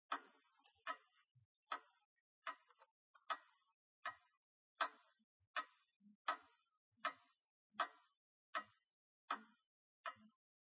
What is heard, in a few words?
tick